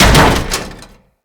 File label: Body Fall Default; Moa; Body Falls